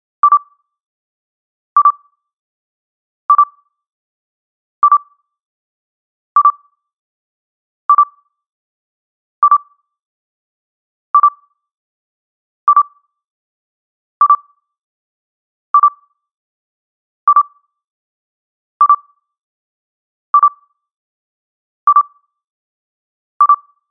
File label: Scanner Blip